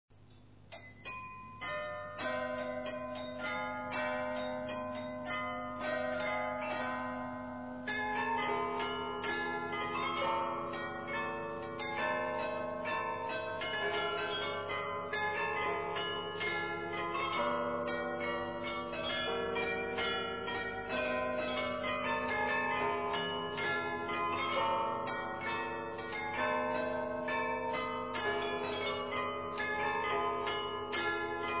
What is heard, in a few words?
glockenspiel